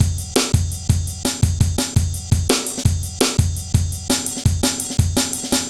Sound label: heavy live ride, drum loops; Zero-G Jungle Warfare 1, 2 & 3